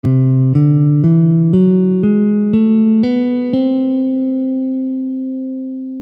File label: Lydian augmented C, Guitar